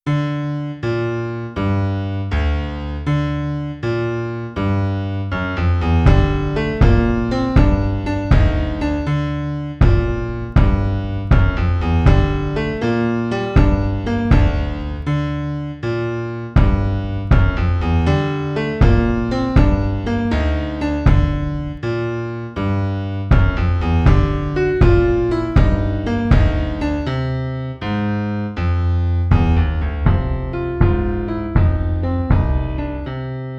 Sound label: piano bass drum